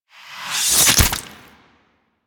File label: Sword Through, Forest Night; Warframe; Sacrifice; Ballas